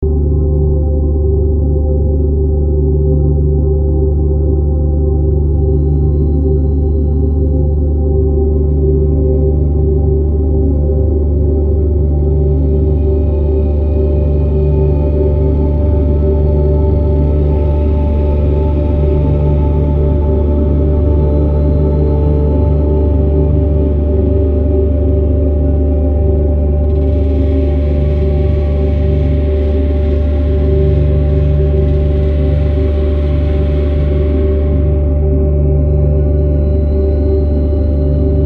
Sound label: tansaku ambient, ホラー系楽曲